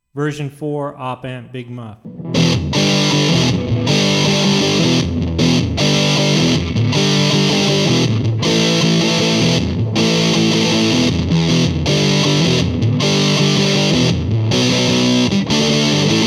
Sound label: V4 Big Muff ICBM SUF Op Amp